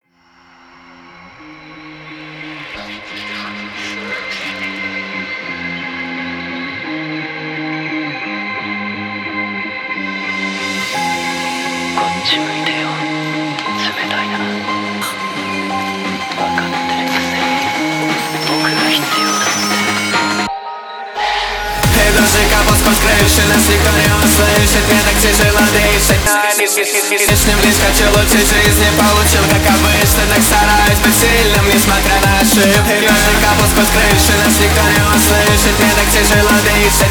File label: heavy rain